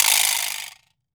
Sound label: vibra slap; Cuba